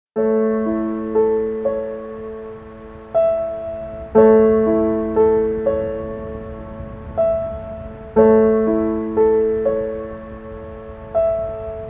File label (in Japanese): soto piano